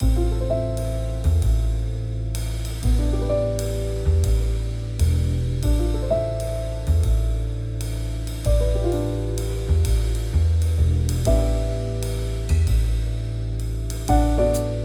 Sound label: jazz in 3; Jazz Ballad in 3/4